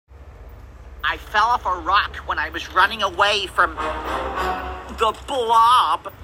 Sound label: dun dun dun; the blob